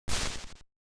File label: Fast